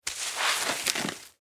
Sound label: Foley Sand Pail Scoop Small Shovel; Playgrounds (Sound Effects:Backgrounds)